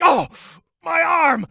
Voices weightlifter scream

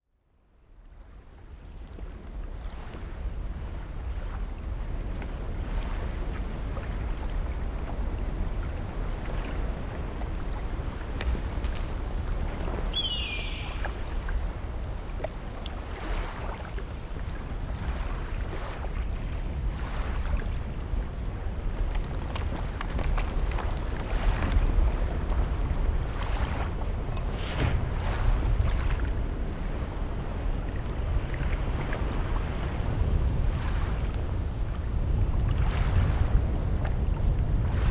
Devil's Spine Ambience (Day)

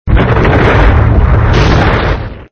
SFX sound (wall rise)

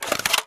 sound Bomb Pickup

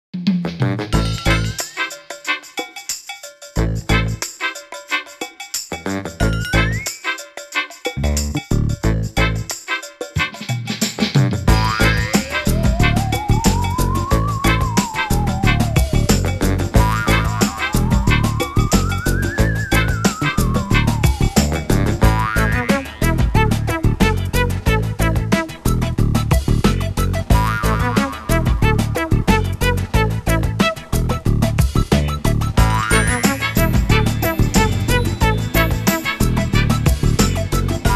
Blasters Menu; Yokai Watch 3

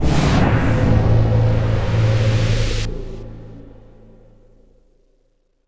Combo Sting

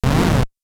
Growl; insmouse no yakata; virtual boy

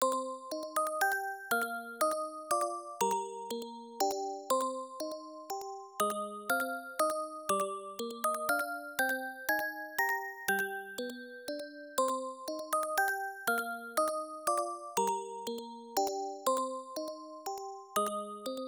Music Box; PC-98 gamerip 1994

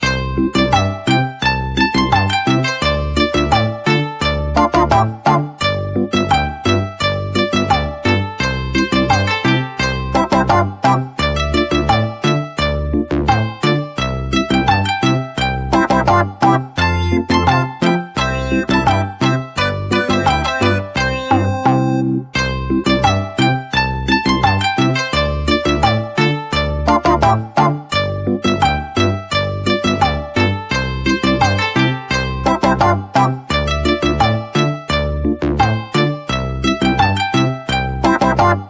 Jingle Rpg Map

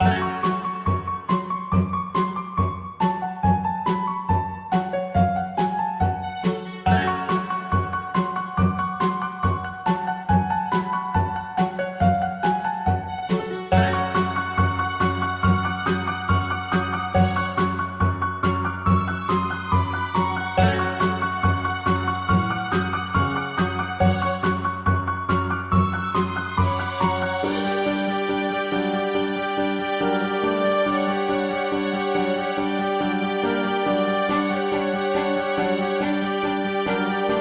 Non Fight

